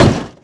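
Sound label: wood pole large, Impact; Cars; XBOX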